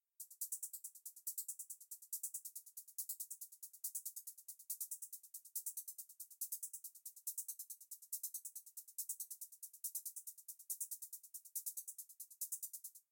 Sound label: hi hat; play of the game